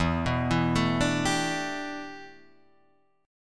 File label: A 7th Suspended 2nd Suspended 4th